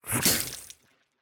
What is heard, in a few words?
Spiters